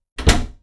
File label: door closed; FEAR